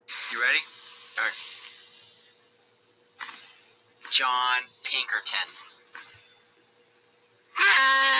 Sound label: ooooh; klay world